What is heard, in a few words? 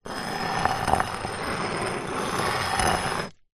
rock or stone movement drag on concrete ground; foley